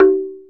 Tongue Hi; Planet Earth; Digital Sound Factory Planet Earth

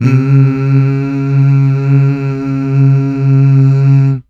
MMHH

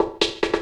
LOOP SD; Ueberschall; Drum & Bass Resonance

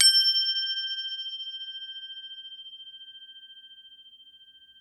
CROTALE G5 L, Crotale

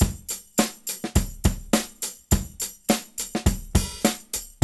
LOOP 104; AMG Gota Yashiki Groove Activator; JR.LOOPS 104

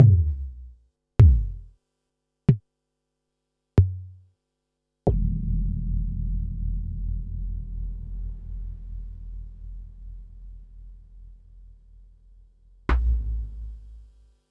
Pearl SC-40 Bassdrum

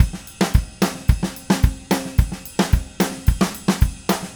Red Maple Groove 110, DW Red Maple Kit

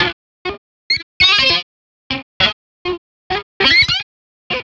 synth 100 eeep; Transmission-X

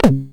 Electron Sidstation, Drum Machines

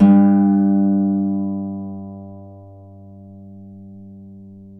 Celtic Harp

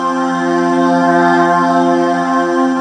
4THS, STACK; HMV 4THS

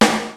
drum; Rubber Chicken Software Sample Bank; DRUMS + PERC; EMU

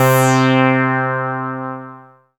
SYN DIGI, SYN MicroWave; Sound & Vision - Gigapack I CD 2

